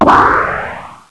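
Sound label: cannon; DARKMOON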